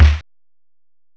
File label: SNARE, DRUMS